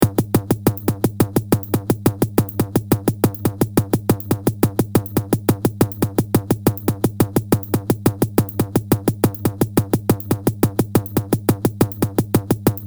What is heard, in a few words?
destabeat; Loop Mania Sampler Pack